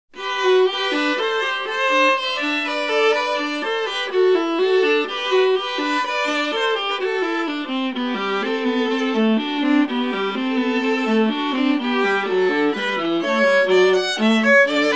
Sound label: Duet for Violin & Viola; Soundzabound Volume 2; Mozart